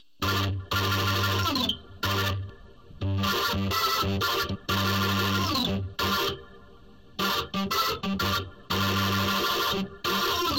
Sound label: organ, distortion; wet; multiband; prism distortion